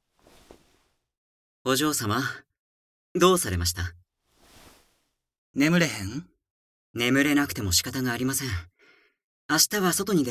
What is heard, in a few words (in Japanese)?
眠れなくてもいいんですよ（耳舐め）